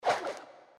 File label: BONUS COIN FLIP; slots; Magic Coin